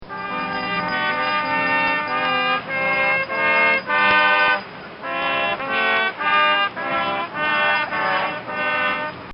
Salvation Army Band

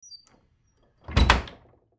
Door Close Solid, Door Close Solid Wood Basement; Household; Miscellaneous Doors; Blastwave

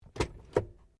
CAR SUBARU LIBERTY INTERIOR GLOVEBOX OPEN; Subaru Liberty 20 01; Family members Subaru; Vehicles

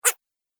Gremlin Huffy, monster; Monster Sound Effects; horror sound effects

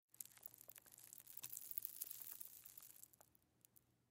Crash Debris Dust Dirt Fall From Ceiling; Blastwave

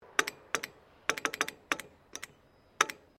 MORSE CODE TAPER SEQUENCE; Morse code aplphabete; Morse Code (Sound Effects:Electronics)

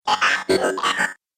Robot Talk Droid

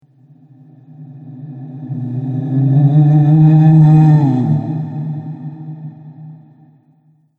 Shadow Spirit, ghost; horror

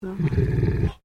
Animal Pig Landrace Growl; Edgar; Edgar's Mission Farm Victoria; Pigs; Animals

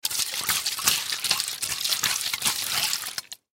Aerosol Shake Can, Aerosol Shake Can Liquid; foley; Shaking Sound Effects; Objects; Blastwave

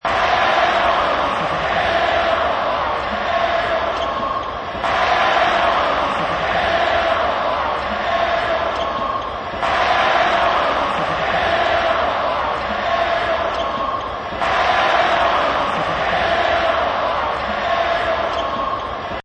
Airball Basketball Crowd; Ringtone

